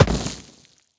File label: snow impact bullet; physics